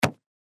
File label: baseball glove catch